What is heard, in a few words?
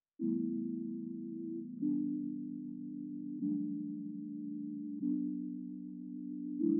150 BPM G Maj Sine; Reverse; Stems; Cymatics; 2022 Melody Collection; Wishing Well